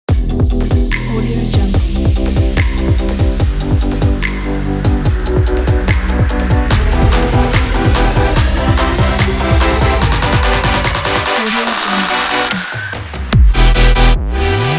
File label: dubstep; blue-sounds